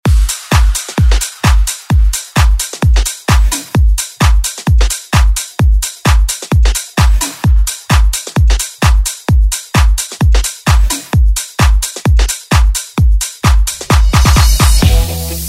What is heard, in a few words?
Classic Dance Redrum